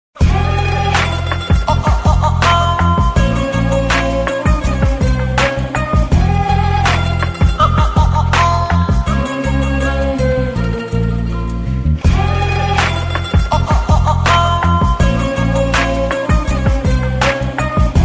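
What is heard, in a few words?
Korean Romantic BGM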